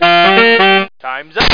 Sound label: TIMEUP; yacht